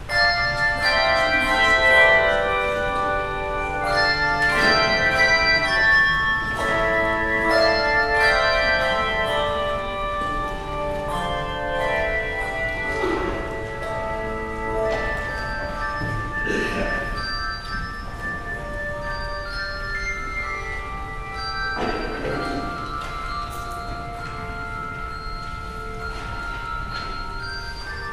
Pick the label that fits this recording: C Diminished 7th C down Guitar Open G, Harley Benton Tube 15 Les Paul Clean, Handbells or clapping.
Handbells